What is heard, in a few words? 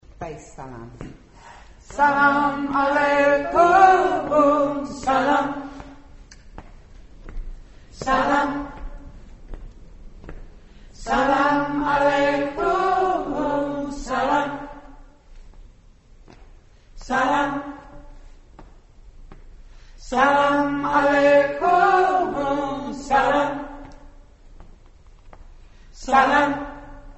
salaam aleikum salaam bass